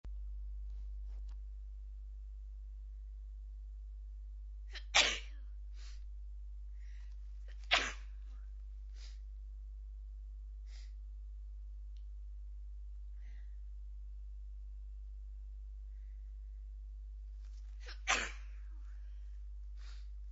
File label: sneezy cold, female